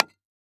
Weapon Axe Miners Pick Into Rock; AdobeCC

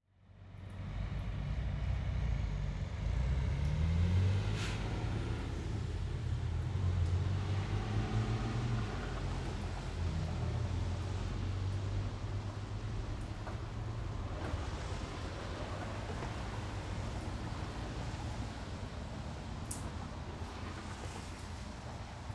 Ambience Traffic In Rain From Bridge; AdobeCC

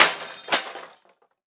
Crash Wood Dropping On Metal And Glass Debris; Crashes

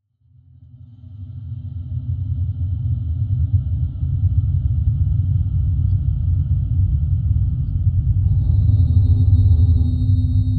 Metal Shelf Tonal Texture; The Metal Shelf; GDC Game Audio Bundle

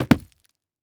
Foley Footstep Work Boots Dirt Debris Jump; Foley Footsteps

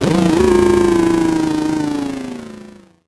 Title Transition Power Down; Production Element

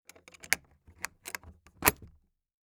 Foley Trunk Latch Closing

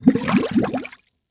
Liquid Water Bubbling In Metal Bowl Fast

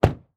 Truck GM Envoy Close Door Exterior; transportation

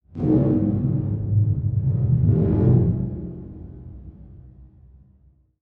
Transition Sweep Drone; Production Element

